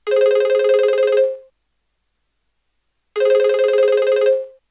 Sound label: nokia 1208 deskphone ringtone